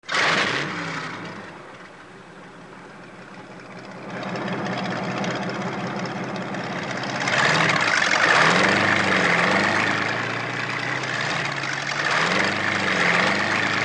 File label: mobiles